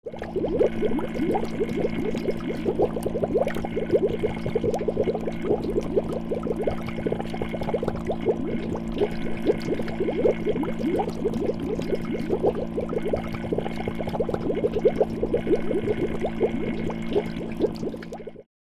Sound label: Fish tank water pump aquarium bubbler